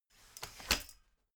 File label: folding chair fold fast